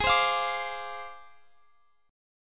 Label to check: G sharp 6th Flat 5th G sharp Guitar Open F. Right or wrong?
Right